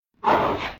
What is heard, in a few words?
guardian Hit